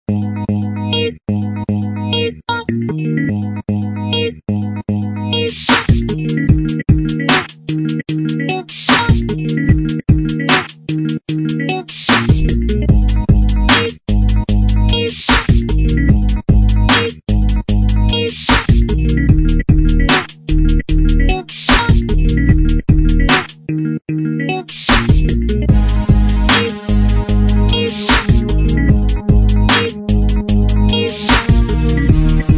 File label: strum roll lofi